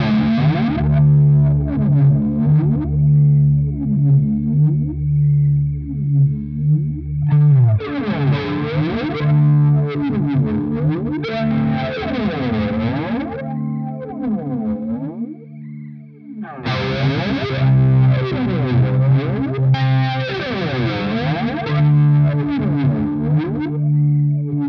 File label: Walrus Audio Polychrome Gain Vibrato